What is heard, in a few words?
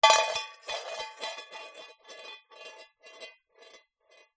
industrial ball bearing metal small drop into metal pot